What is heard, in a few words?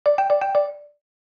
Electric marimba notification